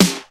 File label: Airy Sizzle Acoustic Snare Sample F# Key